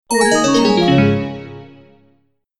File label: karate game over